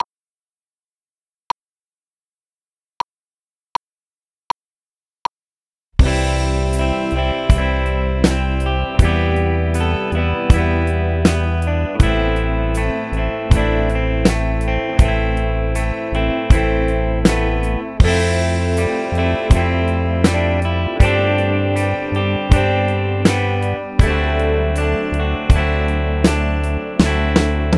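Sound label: Guitar Drums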